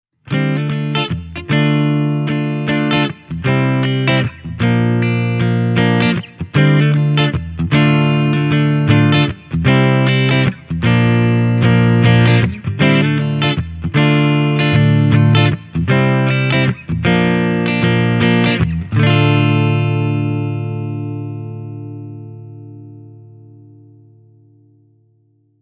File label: blackstar ht metal 60 boutique clean direct